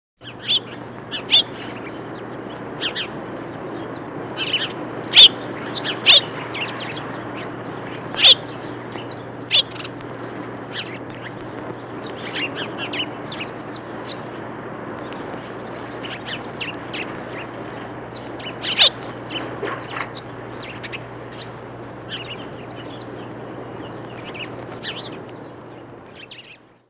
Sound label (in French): moineaux